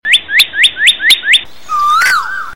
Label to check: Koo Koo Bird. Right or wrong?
Right